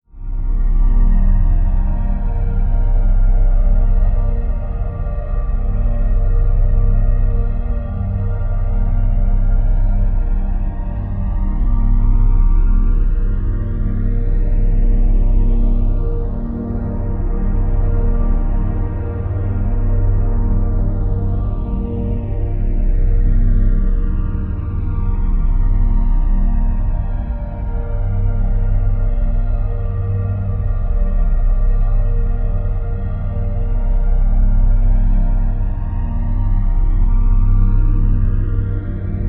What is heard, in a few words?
Drone Bright Choral Deep Bass Constant Phase; Production Element Imaging; AdobeCC